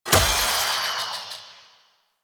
Lantern Destroyed; Warframe; Emissary Season Two

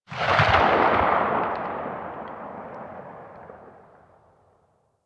Laser Aim Rifle Tail, Weapons; Grineer

